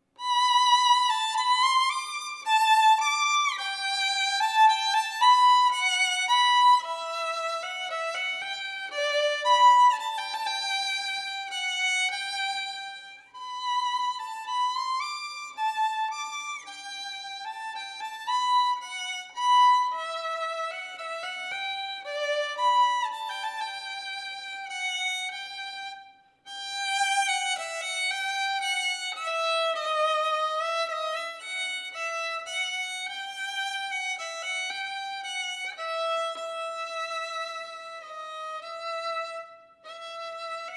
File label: Hornpipe